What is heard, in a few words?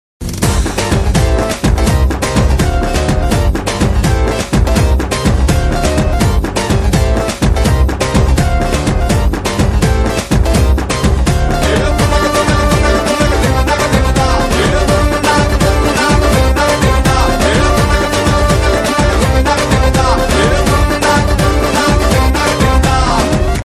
Karaoke Ringtone